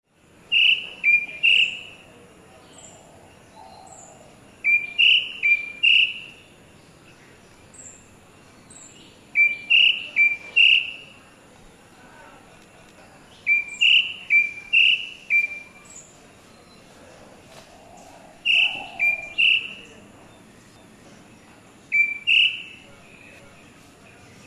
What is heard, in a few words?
White bellied Drongo; Sri Lanka